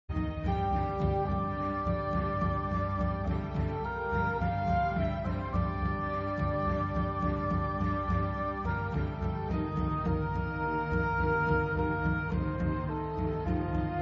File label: Orchestral Mix; Tetris; C64